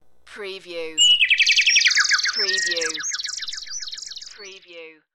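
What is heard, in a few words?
Birds Chirp Short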